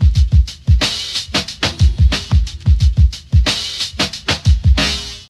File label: Drum loops, Boombap